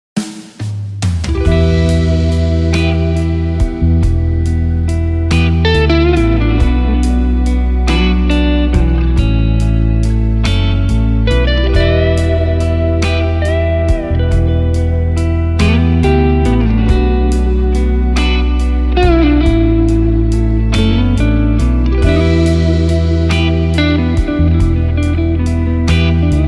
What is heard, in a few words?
Crying Rain Blues